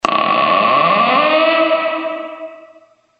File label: door